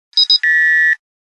Validator otkaz

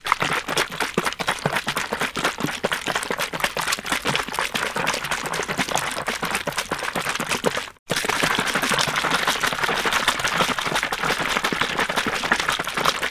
Tryasut vzbaltyvajut emkost s zhidkostju

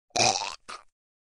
Udavilsya

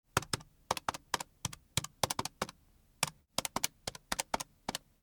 Kalkulyator

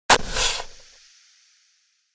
Podushka bezopasnosti

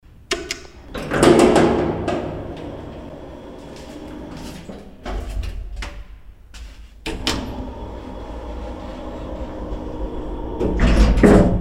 Rossiiskii lift

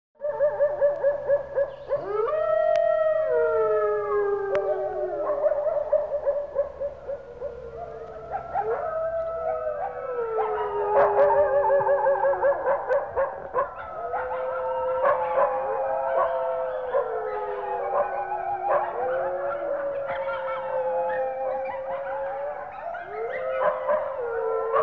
Vojut i lajut sobaki

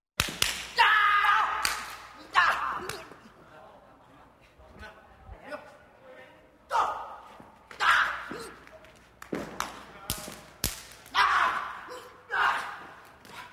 Karate

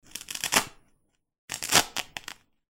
Lipuchki

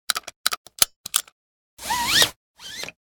Remni bezopasnosti pristegivajut

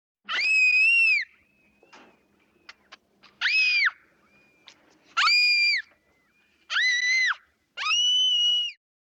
Vizzhit devochka

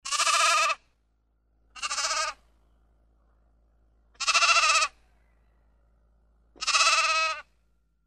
Ovcca

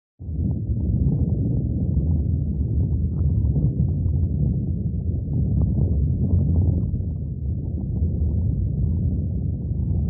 Zemletryasenie

